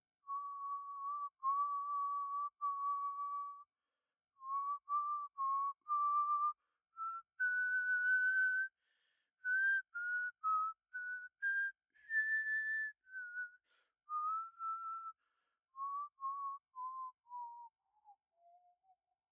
Silbido Hombre

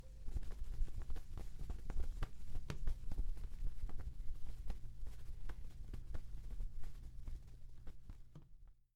Aleteo

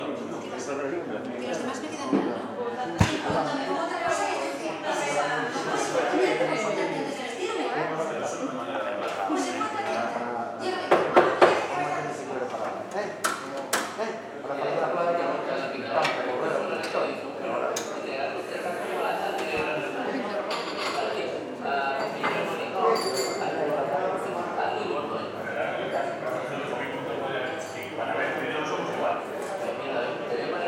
Ambiente Bar Con Tele

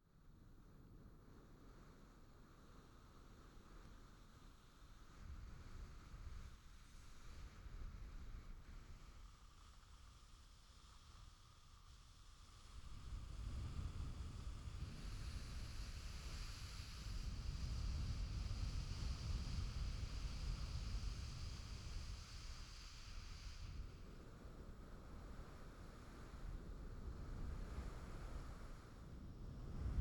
Viento

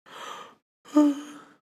Bostezo

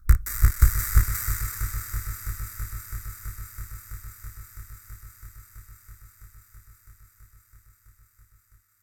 Particulas delay

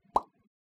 Golpe Corto Y Suave

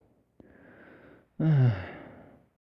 Cansancio

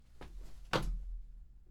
Salto

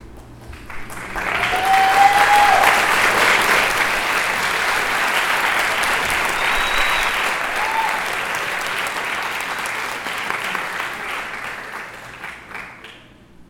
Aplauso

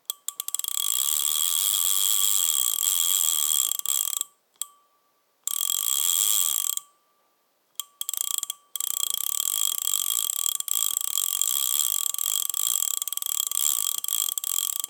Carrete Pesca Desplegar Hilo

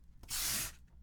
Deslizar

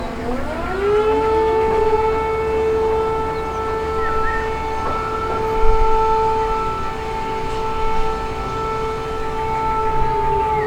Sirena

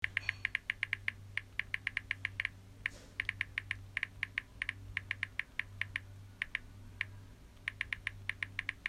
Teclas

